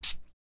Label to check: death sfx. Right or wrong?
Wrong